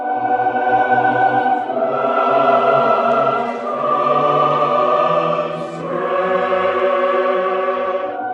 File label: voice laments; Sad